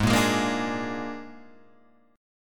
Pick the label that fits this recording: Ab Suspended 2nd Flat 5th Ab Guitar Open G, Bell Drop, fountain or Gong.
Ab Suspended 2nd Flat 5th Ab Guitar Open G